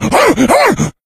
rage at victim, infected; npc; Left 4 Dead 2; Source Engine

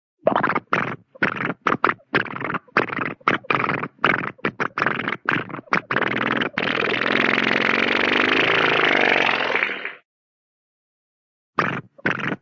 airplane, cartoon funny plane

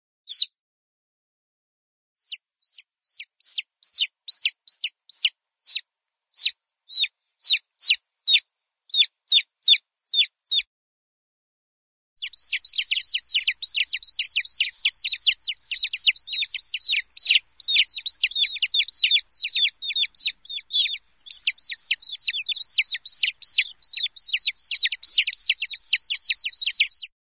bird, chicken single chick peep, animal; cartoon

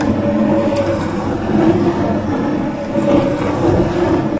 wood box scrape rough loop; Source Engine; video game; physics

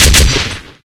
sentry shoot, weapons; tf

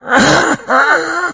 death, infected

